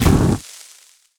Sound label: Fire Tank Footstep; AudioWizards